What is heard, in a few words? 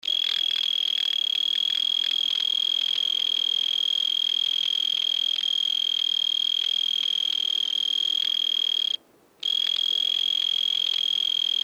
cicada at night